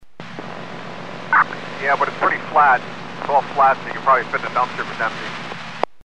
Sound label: FleetSync; Kenwood FleetSync; FleetSync is a digital messaging & identification protocol developed by Kenwood that is used in their line of radios